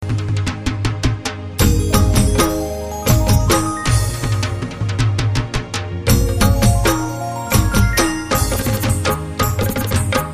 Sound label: Dhol